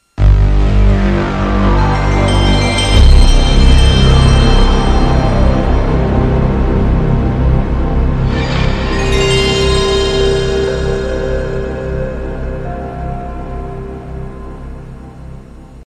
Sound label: Playstation Startup